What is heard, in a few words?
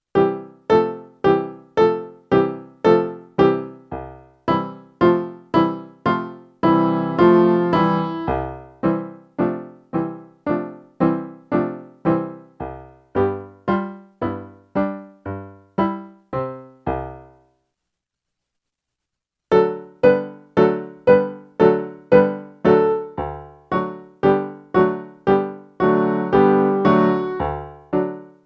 Eighth Notes Rests